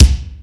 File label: KICK, M1-M3R; Greytsounds VOL-1